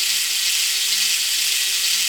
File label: plasma; 2 seconds; ixi